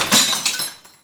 glass hit floor

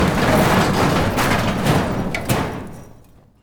metal crash roll

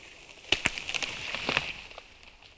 falling tree

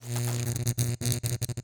static electric

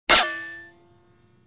bullet ricochet metal

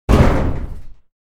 storage container bang

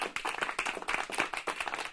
clap hands

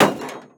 metal impact wood

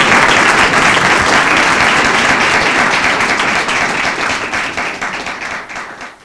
CRISP AP

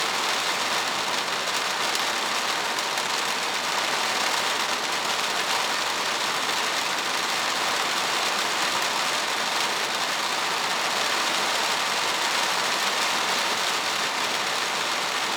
rain window sill

